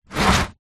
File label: household cool box plastic slide ground